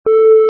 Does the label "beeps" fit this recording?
Yes